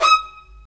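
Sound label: Marcato, Strings; Vlas Marcato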